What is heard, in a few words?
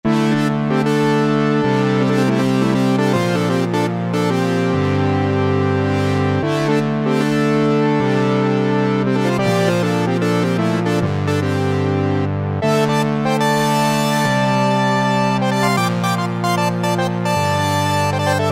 Brass; Cheetah MS6